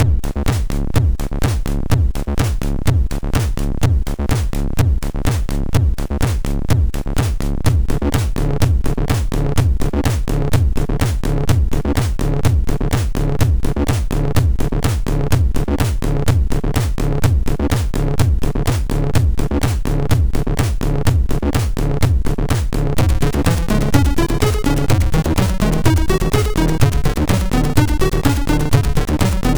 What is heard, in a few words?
sid MOS6581R2